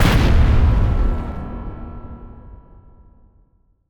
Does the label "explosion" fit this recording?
Yes